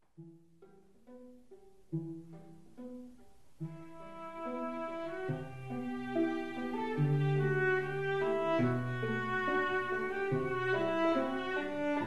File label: Pavane, String Quartet; Faure; Pavane excerpt